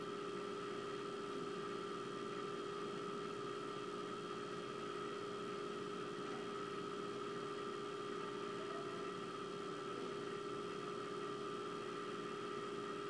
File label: dell precision 650 in my office